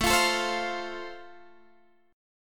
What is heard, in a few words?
A Minor Sharp 5th A, Guitar, Drop D